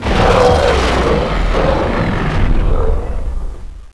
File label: death; Alien Arena; nemesis